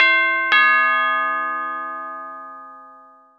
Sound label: Doorbell